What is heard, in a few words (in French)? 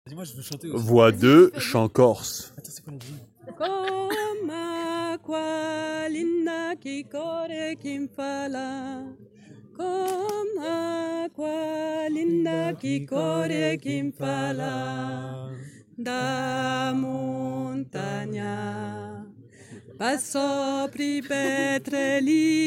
chant corse voix